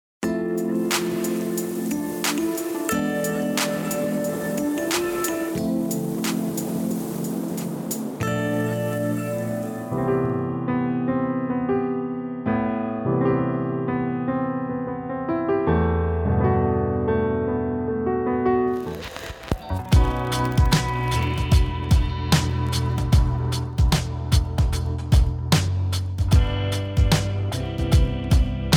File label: Music for Lobby and Lounge